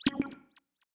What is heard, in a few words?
course zoom out